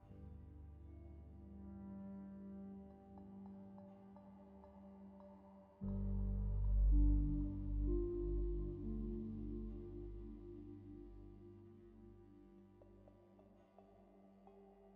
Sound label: Office Loop